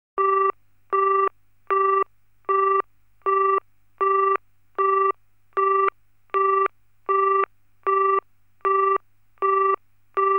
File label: BT Lines AND Tones, Telephone; Computers Printers Phones; BBC Sound Effects Library